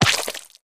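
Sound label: free spins hit heavy forest add; wild worlds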